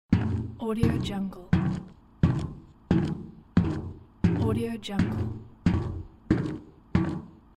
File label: footsteps on metal with boots; aetherfx